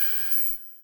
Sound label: invalid selection; allegiance